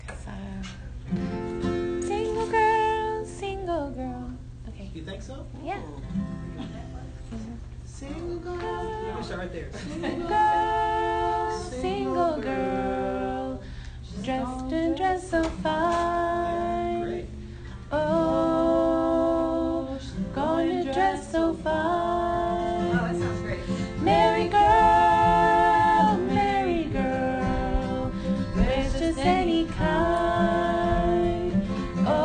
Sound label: single girl, lead